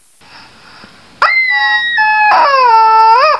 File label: chick attack strong, chicken